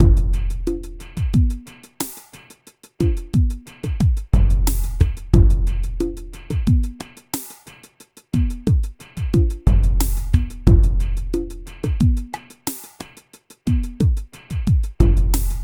Beat, Hip-Hop

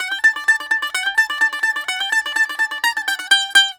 BAGLAM D, Mediteranean

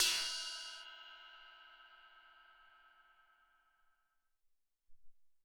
FX Bell, Alesis SR-18

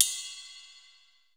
ROOM CY RD, ROOM KITS; Crypton - DrumBase5000

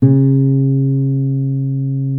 D 3 MARTIN 6, Guitars

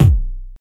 BD, Grunge Hip Hop; USB Soundscan vol.34; AKAI

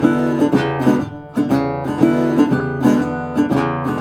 Blues Guitar Samples, riff

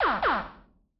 Ragga Blip; Grime; 135bpm; Zero-G Sound Sense Grime Scene; Construction Kits; A Positive Vibe

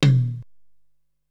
Dry Tom Low, Ensoniq SQ-R-Plus

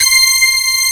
power brass C5; AKAI S6000 CD-ROM - Volume 1; BrassWind; POWER SECTION

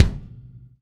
L KICK; Sonic Reality; Interactive Drum Kits

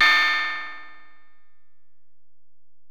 EPerc, Alesis DM-PRO; 300 Drum Machines

